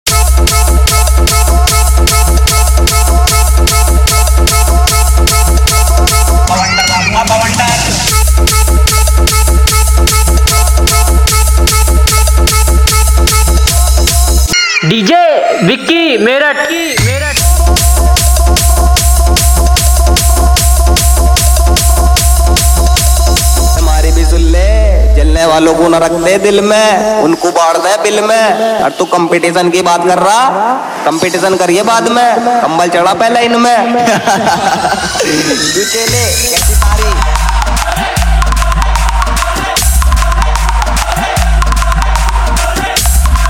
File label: Edm Boom Trance Mixx